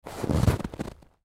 Footsteps Snowshoes Snow Single Step Shoes Impact Crunch; Foley